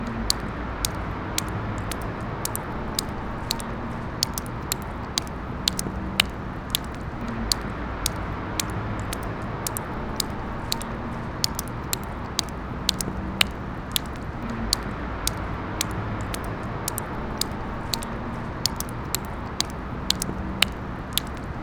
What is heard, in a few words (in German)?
Tropfen